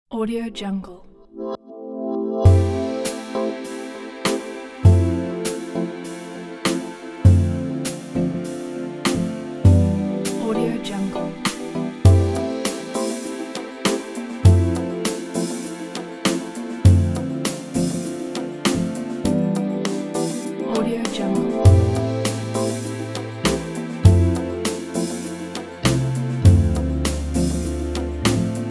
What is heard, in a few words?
lounge bar; sonicscope